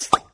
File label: Pop; candy crush saga gamerip